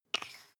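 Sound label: toothpaste close twist hard, Bathroom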